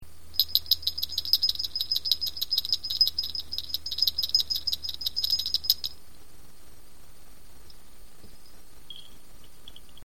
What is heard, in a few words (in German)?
antikstreifenschelle mittel